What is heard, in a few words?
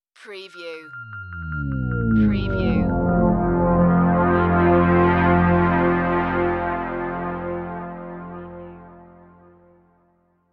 Scifi Audio Logo Ident, Signals, Echo, In for Landing